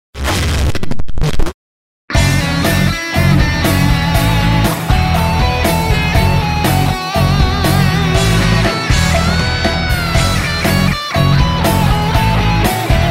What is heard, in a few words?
Cowbell Rock